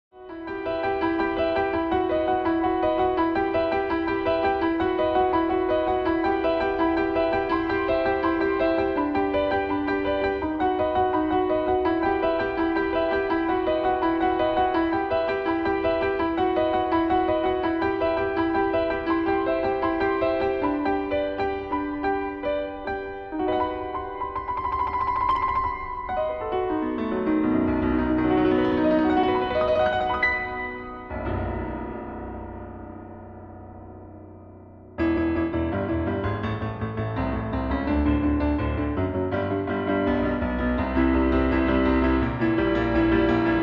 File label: piano version